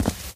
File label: walk tile, footsteps